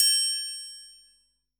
TRIANGLE; EdgeSounds; Drum Mashines VOL-1; R-8 DRUMS

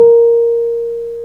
PNO, Roland Keys of the 60s and 70s, Wurly; Roland LCDP10 Keys of the 60s and 70s 2; Wurly 200

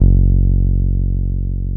TB-303

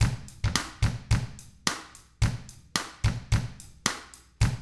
POP, Drums; ILIO; Double Platinum Drums 1

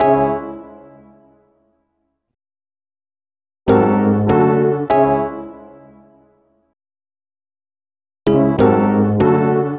French Piano; Sonic Foundry (Sony Creative Software); Club Joints and Street Anthems

